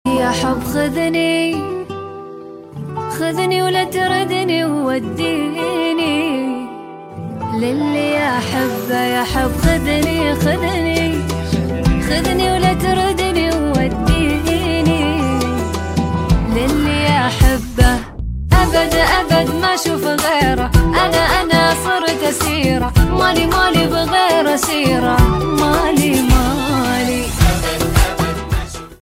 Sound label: arabic ringtone